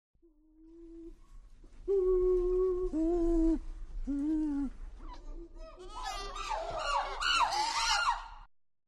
CHIMP HOOT LOW HOOTS DISTANT SCREAM; wildlife sounds

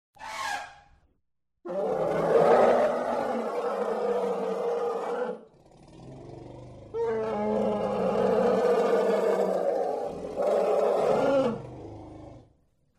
ELEPHANT VARIOUS SNORTS GROWLS TRUMPETS; wildlife sounds

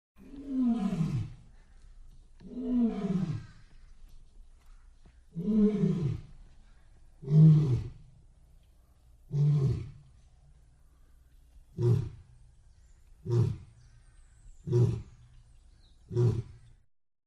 LION NIGHT HONKS MED DISTANT HOOTS; wildlife sounds

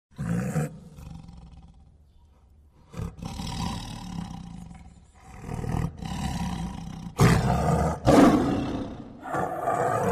TIGER GROWLS CU GROWLS SNARLS ROARS; wildlife sounds

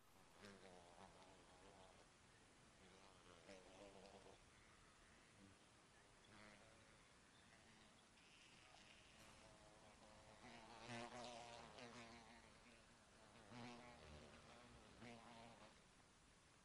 flies single lazy fly bys; wildlife sounds

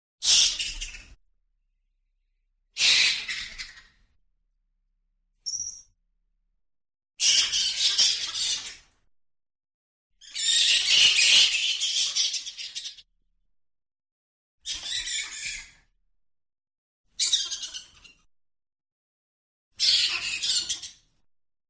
fruit short squawks, wildlife sounds

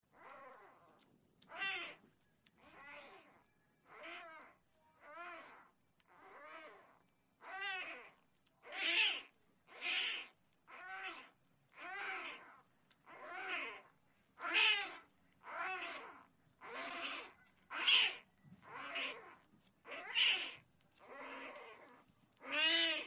COUGAR CUBS BABIES NURSING CALLING; wildlife sounds

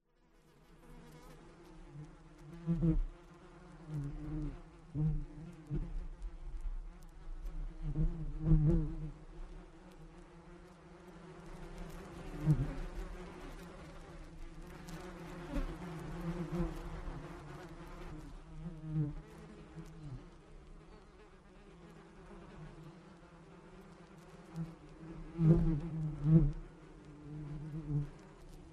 BEES BUZZING FLYING; wildlife sounds

